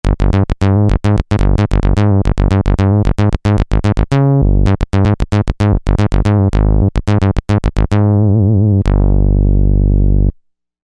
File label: Saw Bass